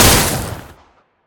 attack shoot

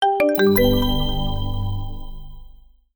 leappad shutdown

